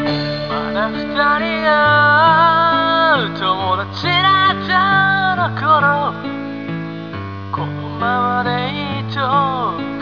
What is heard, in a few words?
beats